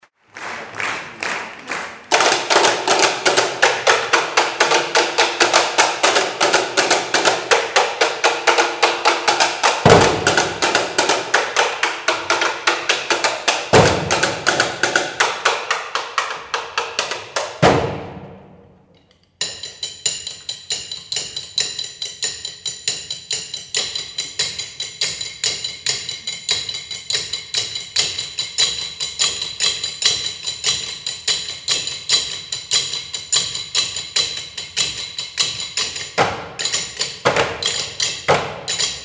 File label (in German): Tambouren Zugabe